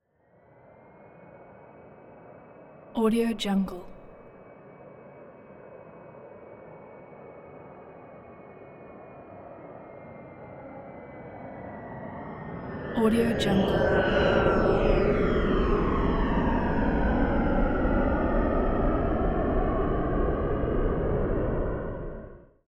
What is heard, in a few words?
transportation jet plane bombardier private flyby left to right